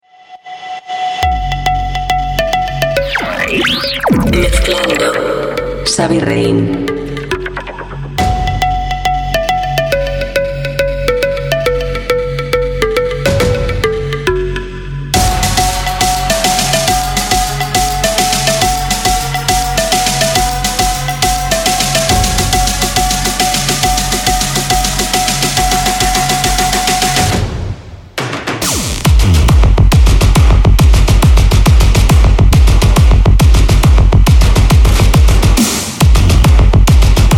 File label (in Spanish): sesion electronica reggaeton